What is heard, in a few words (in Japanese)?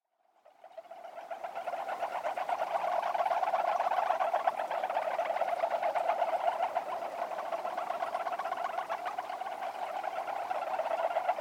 akagaeru